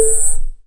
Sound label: ping tsss